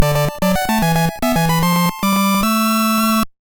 Stage Clear; Castlevania Anniversary Collection

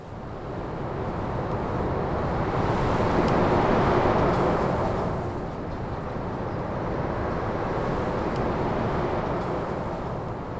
hall wind; Sairento VR; PS4 Windows gamerip 2018

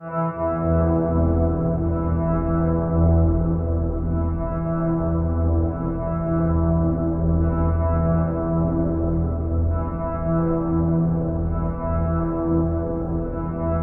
Overlay Drone; Mirrors Edge Catalyst

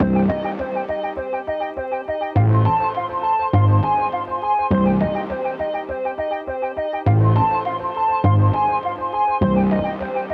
Claws Loop No drums theremin; jenny leclue detectiv

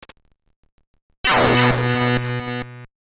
Jingle; Sennou Millennium; WonderSwan

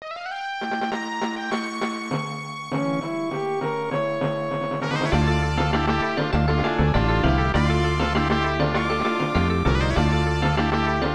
Stormy Naval Battle; Shin Momotarou Densetsu; SNES

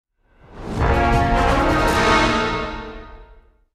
Sting; Aces of the Luftwaffe Squadron

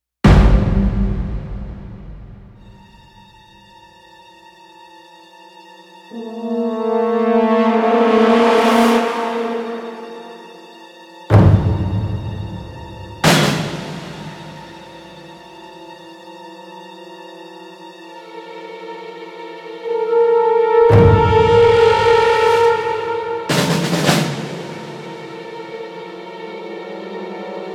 Ambient HEAVY TENSION

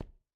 Footsteps Rising Bridge; Main Character

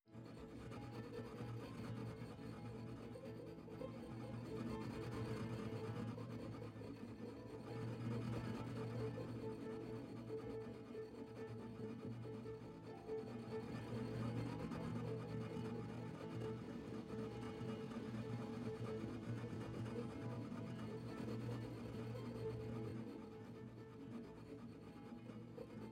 Exploration Scratchy

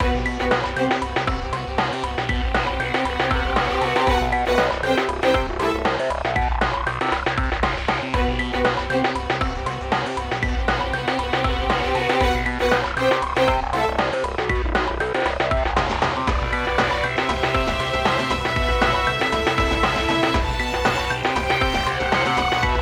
Story Tense; petit cluster

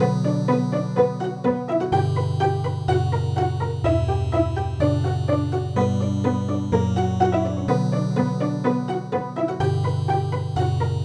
Swamp (Overworld); curse crackers for whom the belle toils switch windows gamerip 2022

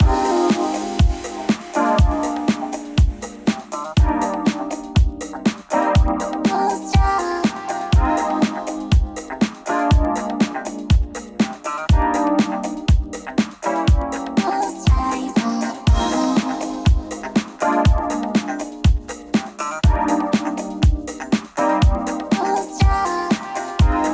Funky Disk Loop; Popslinger

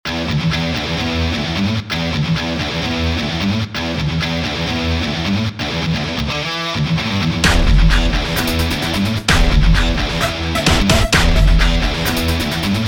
Heavy Riffs; Astro Flame: Starfighter; PS4, PS5, Switch, Xbox One, Xbox Series X|S

